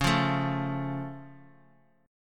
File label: C sharp Augmented C sharp; strummed; JGuitar